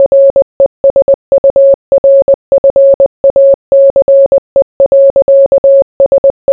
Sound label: morse